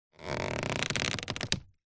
foley basket hamper picnic lid open or close movement creak; zapsplat